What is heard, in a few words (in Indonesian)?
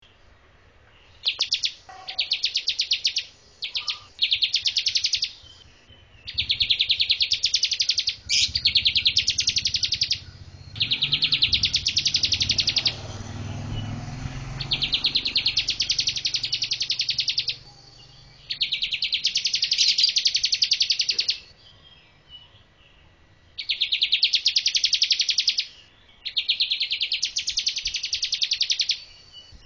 suara ciblek kurawa